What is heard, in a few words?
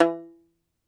Tenor Banjo, Plucked Strings